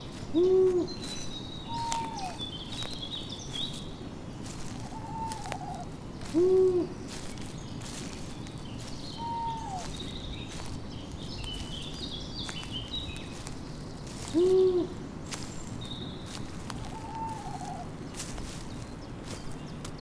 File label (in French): Sons forêt